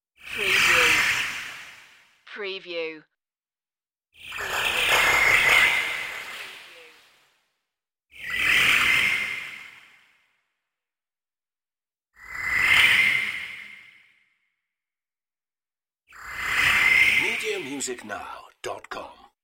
magic flyby buzz

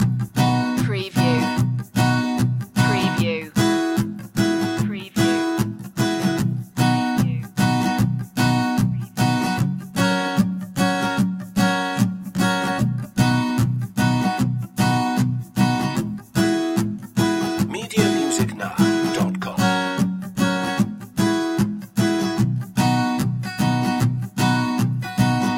gone fishing loop

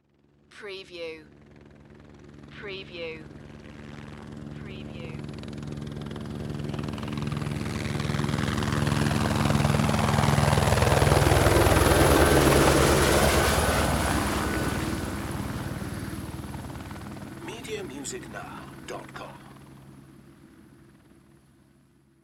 Helicopter Fly By

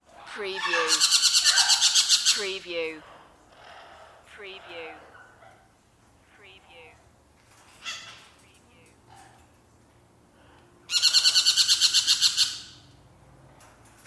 indoor birdcage birds

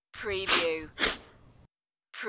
2 sniffs

